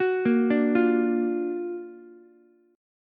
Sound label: F sharp Augmented Major 7th F sharp Ukulele; arpeggiated; JGuitar